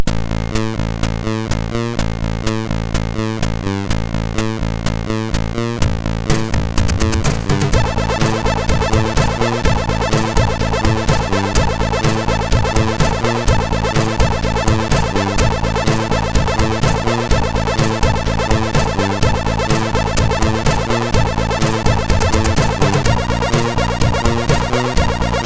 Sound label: Hardcore, sid MOS6581R2